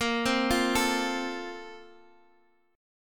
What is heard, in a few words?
A sharp Major 7th Suspended 2nd, Guitar, Half Step Down; A#M7sus2